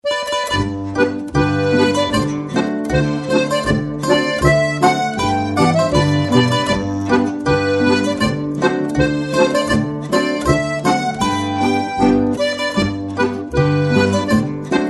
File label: polka